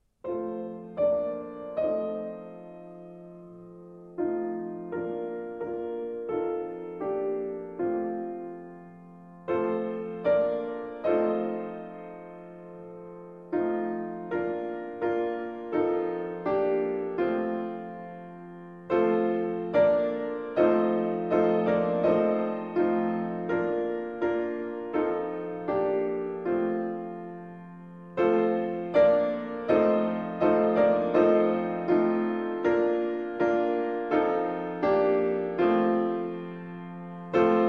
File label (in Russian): mujik na garmonike igraet; Детский Альбом (Чайковский)